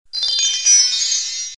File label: piano